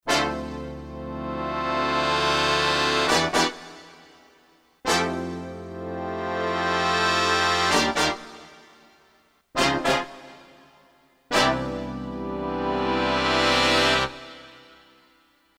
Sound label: SFZBRASS, Korg DSS-1